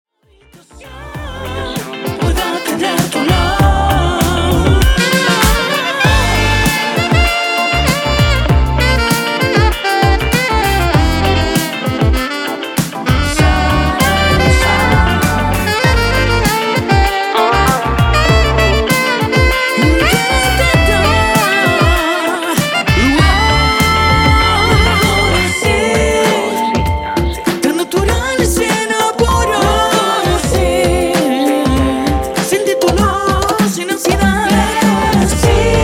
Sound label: Latin Pop